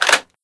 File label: mp5k clip out